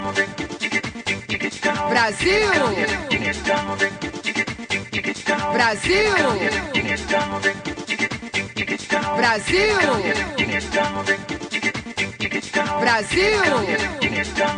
Brasil samba; Latin

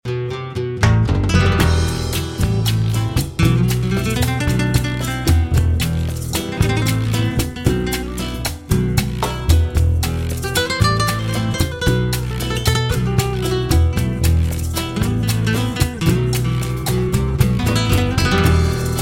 flamenco; Nuevo Flamenco; Latin

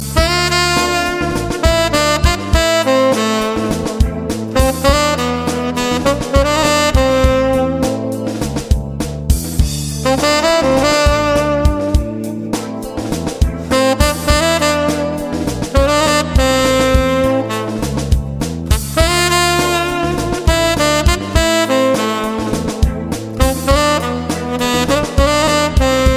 jazz

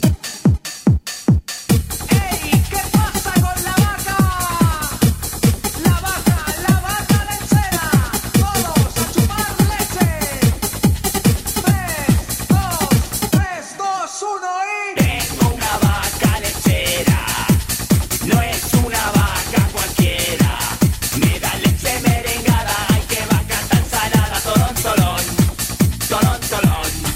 techno dance; Latin

